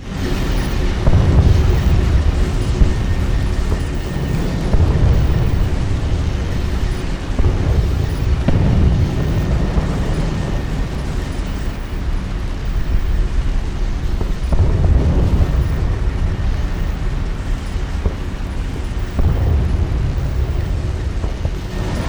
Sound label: Clone Death SFX